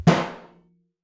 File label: timp snare tenor ff; Percussion; HSS OP Pack